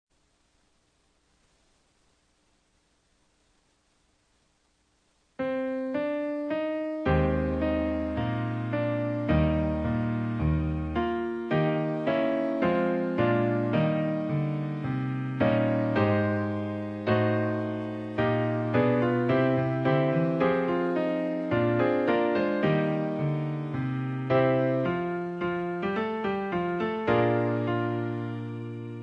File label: Loyalty Song Piano; TBS